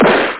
plode

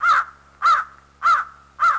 crow; DAoC; Toa

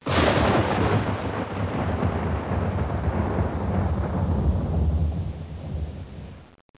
Thunder

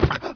player land; PC Gamer IT CD 41; Daikatana

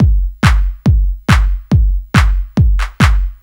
Phatt B, Techno Drums; TechnoMusicMaker

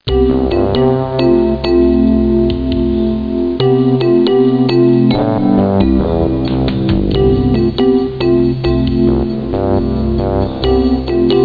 game music

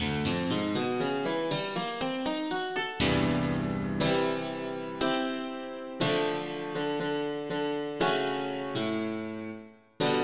Santur; EverQuest

